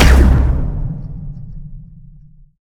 pwr fire; smite; Anhur

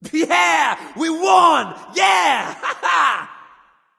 nv yay; smite; voicepack; xbalanque

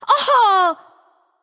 Yay; daji

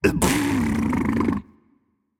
tongue, voicepack; smite; jorm

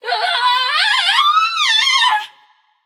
fear passion; chaac

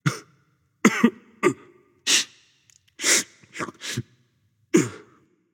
boo hoo; smite; arthur

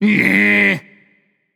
nv tongue; smite; voicepack; hachiman

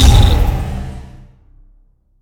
ball explode; smite; chronos

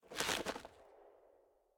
gugnir feathers; smite; odin

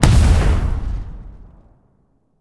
intro cannon; smite; vulcan

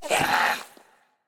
minion death grunt; smite; npc; roman archer

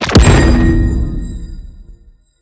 atlas ground slam; smite; god atlas

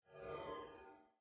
idle swish sickle; smite; osiris

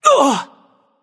grunt hit; smite

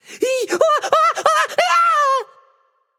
fear, voicepack; smite; jorm

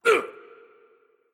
hitreact human male small

